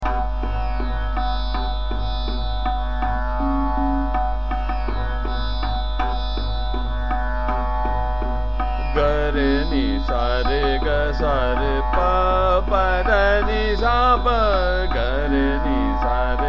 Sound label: Sargam, g R n S R g S R P, Trital; Kafi